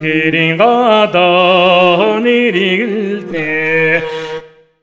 MONGOL, Asian Traditions

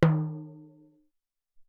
Timbal

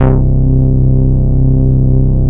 DAN, synthesizer bass; VFX-SD

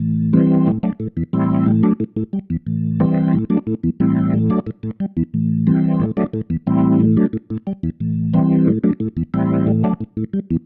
Pattern, Organ

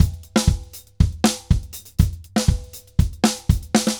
drums, merengue; World Grooves; Best Service ProSamples vol.14; AKAI

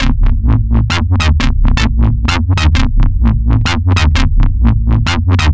Wowwy Bass, Drum n Bass; KJ Sawka; Drum n Bass & Beyond

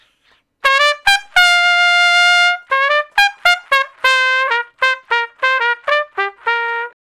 Trumpet Straight (G); Solos

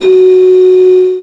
flt fs4, MIRAGE Bell + Flute

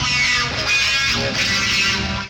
Guit Metachord; Drum N Bass; Misc; Sonic Foundry (Sony Creative Software); Knutrix Drum & Bass

